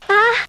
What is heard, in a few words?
huh female question, Voice; ooh ahh; heh huh